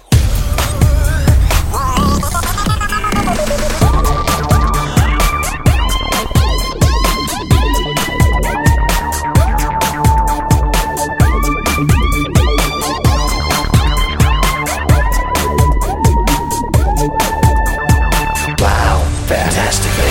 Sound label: BGM Telugu Ringtone